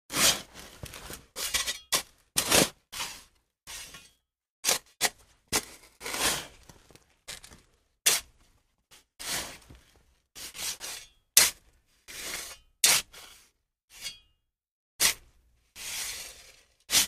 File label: Shovel Dirt